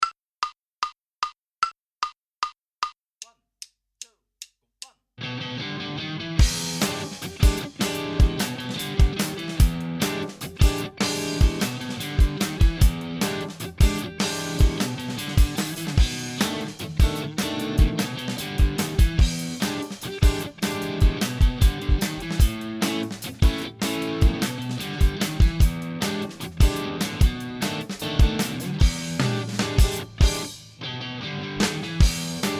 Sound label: Minus Bass; Inst Ensemble